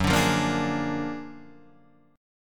F sharp Augmented Major 9th F sharp Guitar Half Step Down